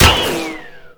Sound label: Shield Impact; Tank; Warframe; Lasrian Tank